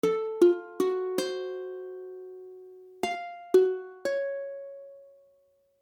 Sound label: Fismmaj7, ukulele; ukulele akordy